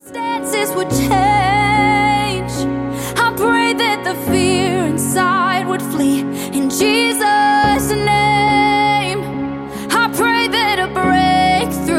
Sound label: piano version